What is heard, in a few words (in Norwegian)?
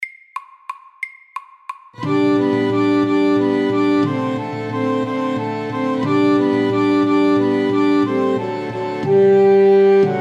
Irsk melodi FORT